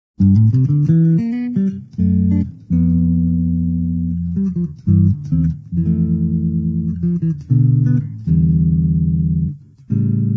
Guitar Electric Bass; Zomboid; Lifestyle

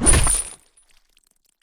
Chain Bat Hit; Scrap Weapons; Zomboid

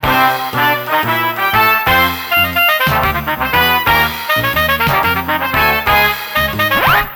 dj booth fail; Zomboid; Lifestyle

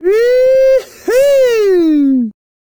Man Woohoo; voice; Zomboid; Lifestyle

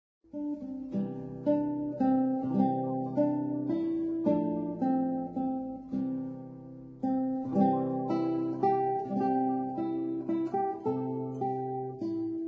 guitar acoustic; The Banks of the Don; Zomboid; Lifestyle

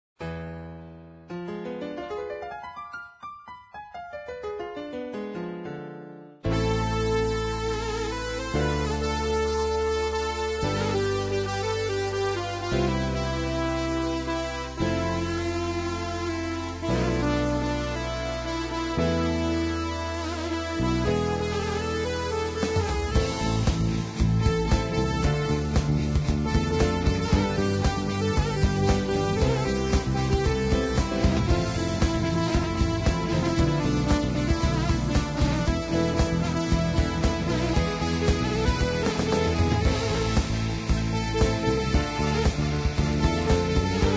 Keytar; Zomboid; Lifestyle

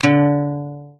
Guitar Acoustic Failstate; Instruments; Zomboid; Lifestyle

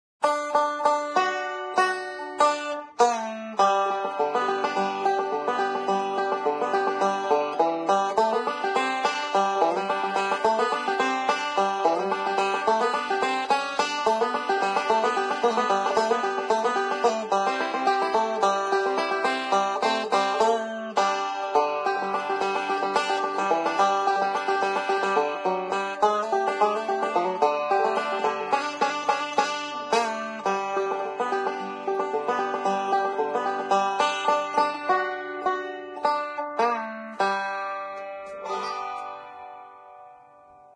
Banjo; Zomboid; Lifestyle

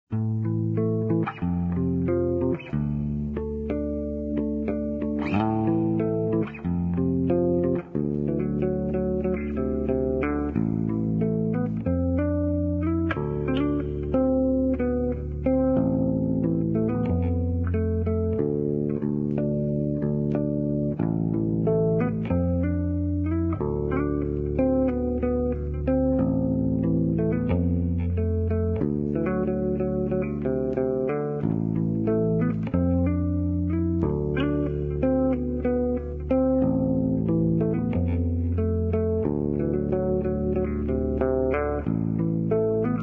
Guitar Electric Bass; Zomboid; Lifestyle

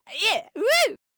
Man Dance Happy; Zomboid; Lifestyle

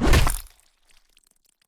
2x4 Hit Gore; Scrap Weapons; Zomboid

